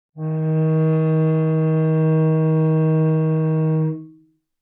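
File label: Cello arco fortissimo sul C Eb2; MIS Pitches - 2014; Strings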